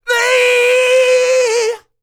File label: ROCK, MALE HUMAN; ILIO; Vocal Planet